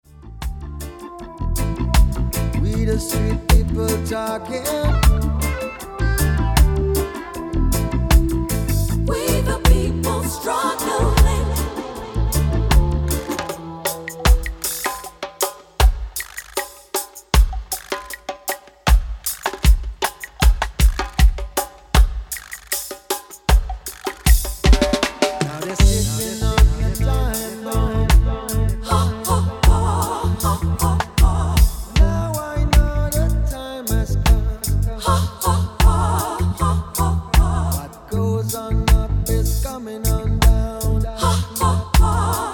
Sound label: drum bass